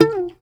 SHUR NOTE, TOPSHUR NT; Soundscan vol.60; Asian Traditions; AKAI

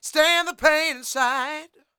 SING, Vocal Planet Blues Soul BLUESY

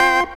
ORGAN A

